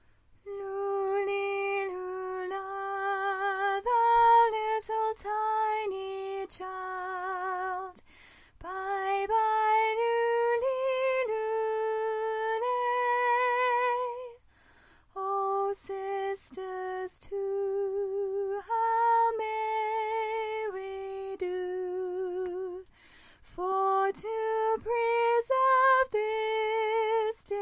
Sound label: Coventry Carol soprano, choir